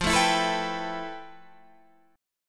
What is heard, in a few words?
F Major Sharp 11th, Guitar, Standard; strummed; JGuitar